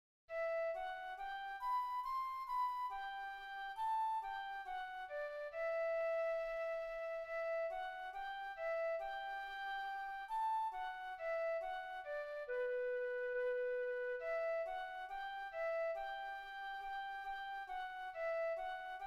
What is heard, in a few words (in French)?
FLUTE